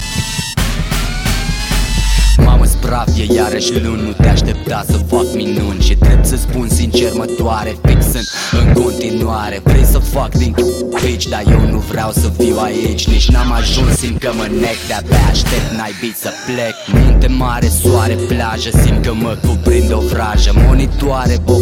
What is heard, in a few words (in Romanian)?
alarma